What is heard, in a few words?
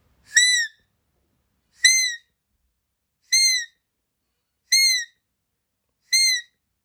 Roe Call, deer call